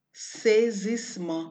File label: shock